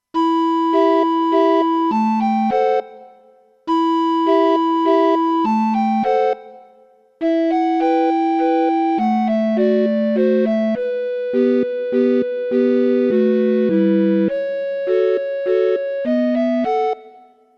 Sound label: A Sad Waltz